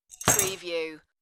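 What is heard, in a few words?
Metal Bar Tool Drop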